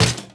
bad part metal

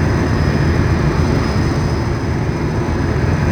airport hangar, ambience; Soldier Of Fortune II Double Helix; XBOX

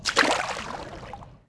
Med Splash, Dino

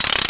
Diver Ratchet; Finding Nemo; XBOX

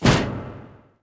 impact table hard; impacts explos

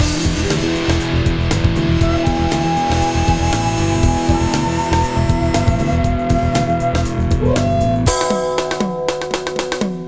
intro loop; XBOX; Arctic Thunder

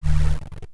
zap; electrical sparks

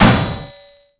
metal hit; Tony Hawk's Project 8; XBOX; bouncyobjects

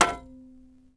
pipe hit creak; impacts explos

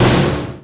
bouncy ashtray hit; bouncy objects; Tony Hawk's Project 8; XBOX

